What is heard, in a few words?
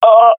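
death, swat female; vo; Counter Strike Model Pack; CS:GO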